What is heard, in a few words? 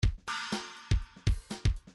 grooves funk; Midi File